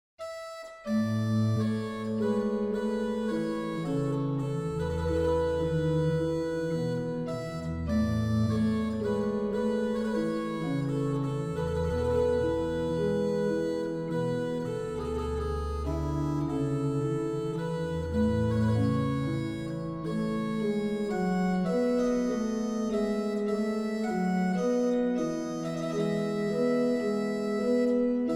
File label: Noel Var, organ; Balbastre; callido